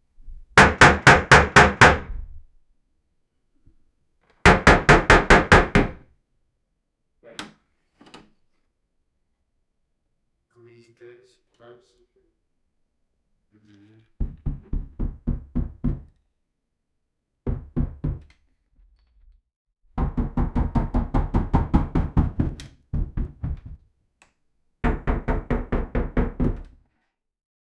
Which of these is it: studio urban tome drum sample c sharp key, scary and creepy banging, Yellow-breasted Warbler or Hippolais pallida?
scary and creepy banging